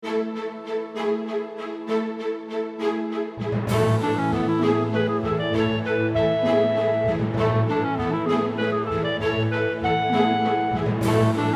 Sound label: ringtone